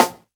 Snare Vinyl, drums one shots

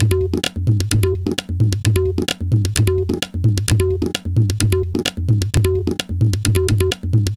Tabla 130 bpm; Loops; Drums; Percussion; Ableton Live 12 Lite; Core Library

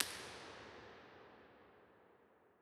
Cathedral L; Bigger Spaces; Beauvais; Impulse Responses; Ableton Live 12 Lite

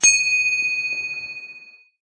idea hit; There; ThereClient

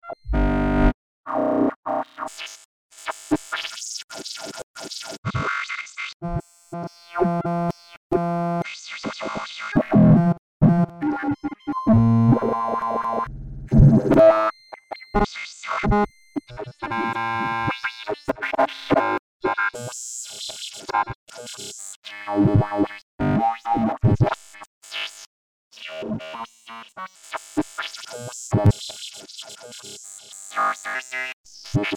Cave Guardian Piece Idle Babble Loop; Journey

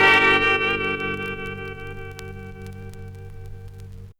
Stab Guitar Gmin; One Shots

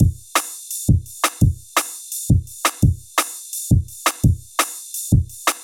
Drum and Bass Down 170 bpm; Loops; Ableton Live 12 Lite; Core Library; Drums

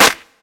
Clap; Ableton Live 12 Lite; Core Library; Drums

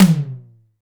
Tom; Ableton Live 12 Lite; Core Library; Drums

